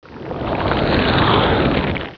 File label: WAT SUCK, Water